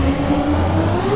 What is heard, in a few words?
displacer spin, weapons